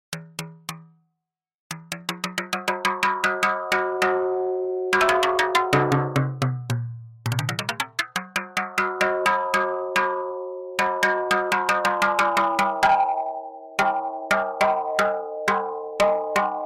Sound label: synth test